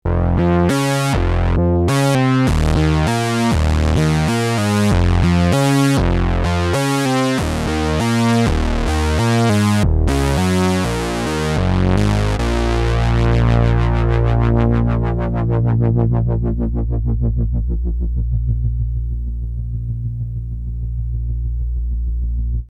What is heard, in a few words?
supersaw